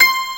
PIANO, HOUSE; WORS STATION

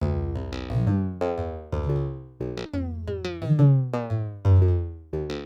TABLAS; Zero-G; Chemical Beats

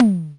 electric tom, Yamaha DD-10

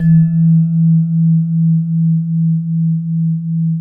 VIBRAPHONE F; ILIO

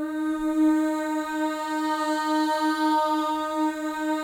MOVING V3, Symphony of Voices; Spectrasonics

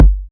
Tr808 kick hard

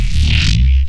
neuro bass compress

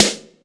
SN, DANCE

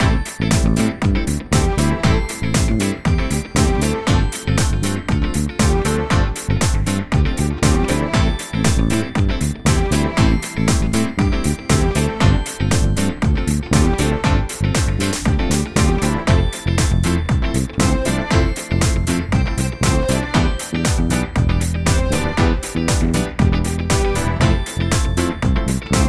Full Mix, Nu Disco 118bpm Am; Zero-G; Construction Kits; The Hitmaker

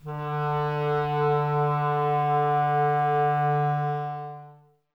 Clarinets, Wood Winds

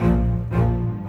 Rock Pop Bass Cello, Orchestral; Sonic Foundry (Sony Creative Software); Orchestral Series VOL-4 - Rock and Pop

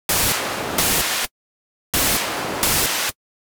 Gated Seq Noise, Gate Filtered 130BPM

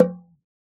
oxaca, percussion; ILIO

